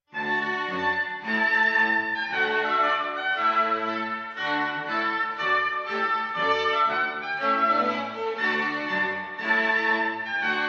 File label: Trumpet Tune